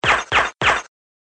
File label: Gun Silencer